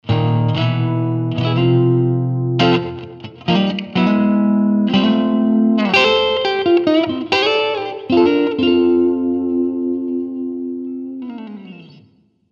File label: Charvel